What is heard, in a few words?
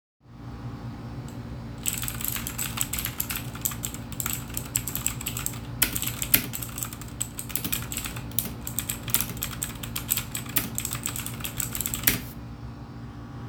Keyboard